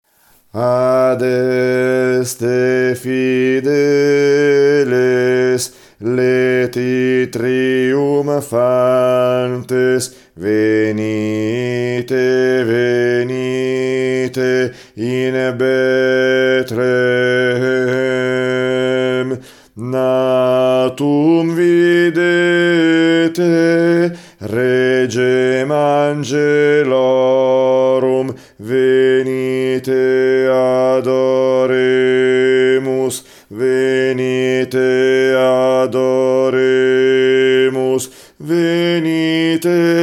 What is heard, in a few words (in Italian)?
contralti; Adeste Fideles